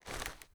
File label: taurus; daffas arsenal; tacz